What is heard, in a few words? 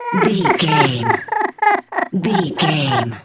goblin fairy cry low